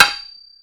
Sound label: hit metal pole; GTLive